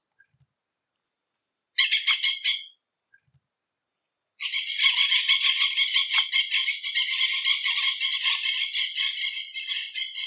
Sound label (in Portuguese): Canto da tiriba de testa vermelha